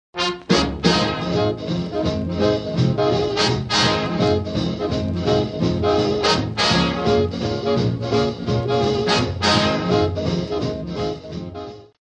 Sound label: Music In Style Example